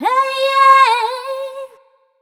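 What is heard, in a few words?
"uh" female, 138, b-flat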